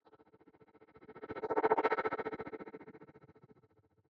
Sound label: flying object pass by; soundscrate